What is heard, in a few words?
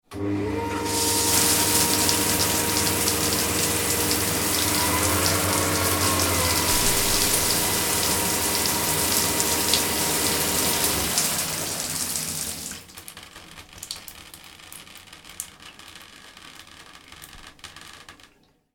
household shower electric on run and off; Shower ( Electric ) Turned On And Runs For Approx 10 Seconds Followed By Being Turned Off; Bathroom; McKinney Sound